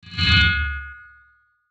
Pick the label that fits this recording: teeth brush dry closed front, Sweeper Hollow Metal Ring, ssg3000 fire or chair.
Sweeper Hollow Metal Ring